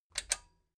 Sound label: metal button stereo click disable fast